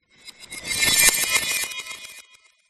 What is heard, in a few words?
reversed broken glass; sound design transition; zapsplat